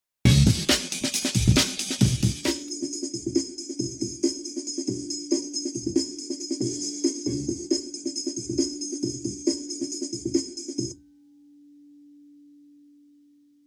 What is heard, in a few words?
bowl dry Wet; schoeps